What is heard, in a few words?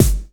SNARE